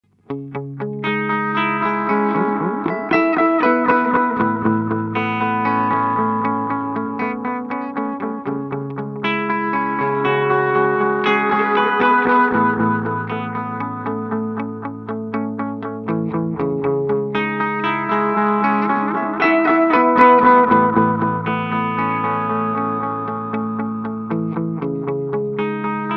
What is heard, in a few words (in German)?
Way Huge Aqua Puss Rhythmische Delays